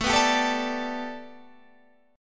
A 7th Suspended 2nd Suspended 4th Guitar Standard; A7sus2sus4 strummed

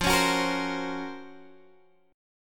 Gb Major Sharp 11th F

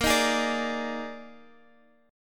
D sharp Major 7th Suspended 4th, A sharp

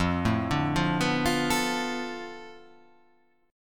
F 6th Flat 5th F down, chord

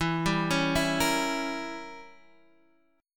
E Diminished 7th E down Guitar Half Step Down; Edim7

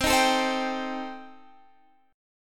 A Minor 7th Flat 5th C

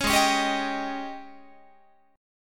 G sharp Major 7th Suspended 4th, Guitar; strummed; 16,16,16,17,19,18; 4A,4E,5A,5D,5G#,6C#; G#M7sus4

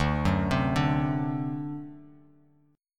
D 7th, down

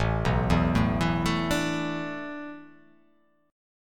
A Suspended 2nd Suspended 4th; Asus2sus4 arpeggiated

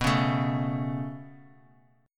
B 7th Suspended 2nd; B7sus2; strummed; JGuitar

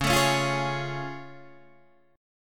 A Augmented Major 7th C sharp

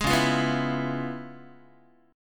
C sharp 7th Sharp 9th Guitar, chord; strummed; C#7#9; JGuitar

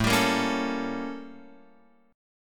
A 7th Flat 9th Guitar Open F; A7b9 strummed; JGuitar

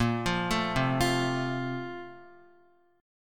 Bb Major 7th Suspended 2nd, Guitar; BbM7sus2 arpeggiated; JGuitar

